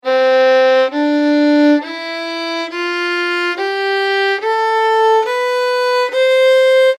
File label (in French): gamme violon